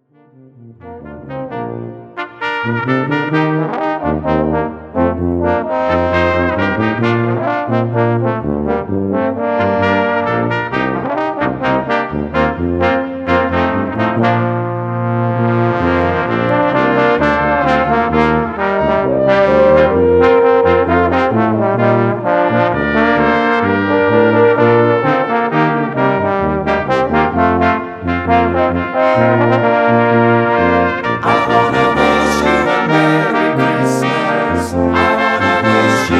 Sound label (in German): BRASSQUINTETT